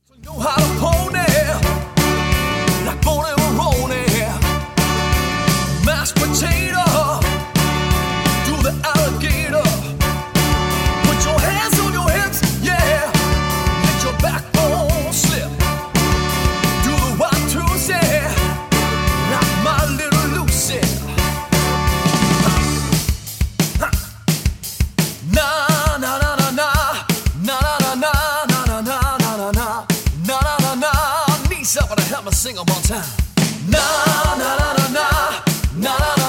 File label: Horns